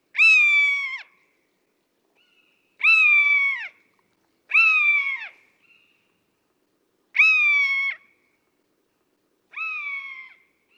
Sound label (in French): buse variable, Rapaces diurnes